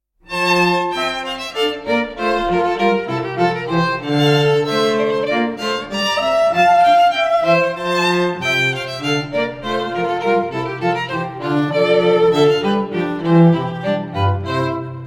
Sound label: Hornpipe in F